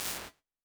Brick Wall, Lexicon 480L Reverb, impulse responses; Big Gee's